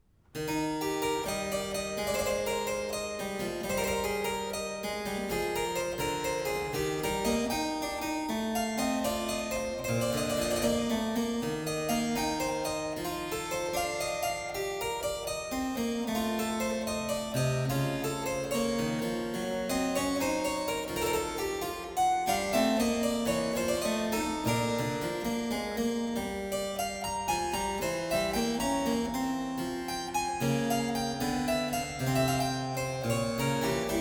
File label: Prelude in E Major; Book 1 Prelude No. 9; BWV 8541; J.S. Bach; Das Wohltemperierte Klavier; The Well-Tempered Clavier, Volume 1